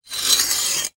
foley metal piece small flat rusty drag scrape on another; zapsplat